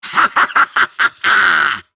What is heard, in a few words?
Laugh; Warframe; Grineer Catbrow Handler; Battle Barks